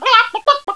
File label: chicken; Princess and the Pea; SoftKey